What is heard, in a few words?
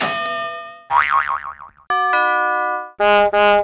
Answer Buzzer Sounds